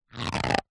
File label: balloon skin stretch; foley